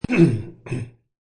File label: Man quickly clears his throat.; Clearing Throat; Human